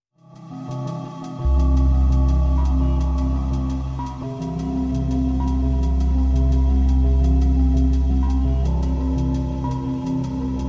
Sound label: Beat